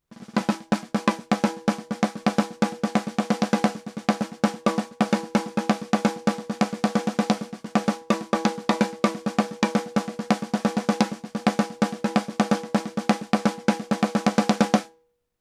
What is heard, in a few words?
Fredenstein Artistic MicPre SD 300 Ohm